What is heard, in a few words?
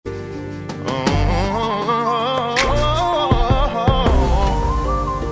low to high oh